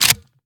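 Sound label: shotgun rechamber; reloads; iw8; romeo870; gmod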